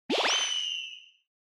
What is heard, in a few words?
Motion Agility Long; モーション 俊敏